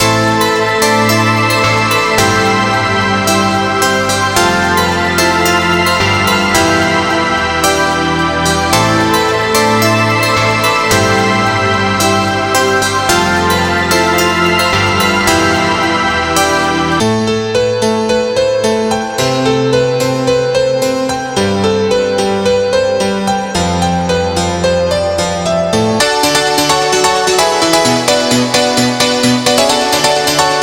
piano; Darwins

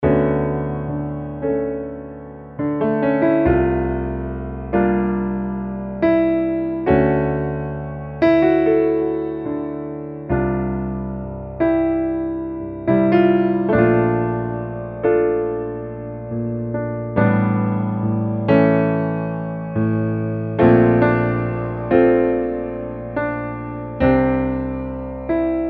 Lounge Vibes

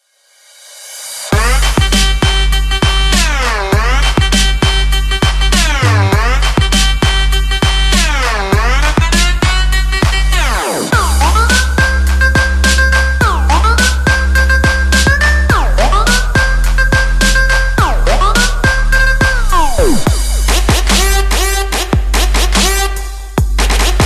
TRAP AND TWERK BASSLINE AND RIFF LOOPS; DMS

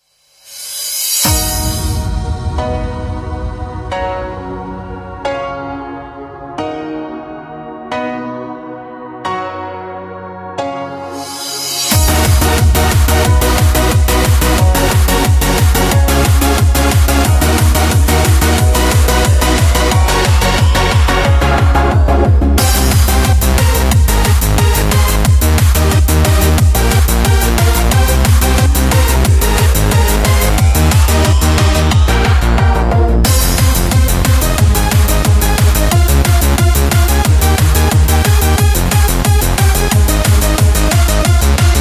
hardcore loops; EternalWaves

